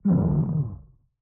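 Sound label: Visage Father Damage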